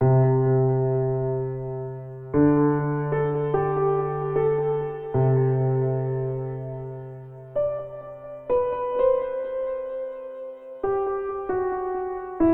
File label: E Minor Piano Jam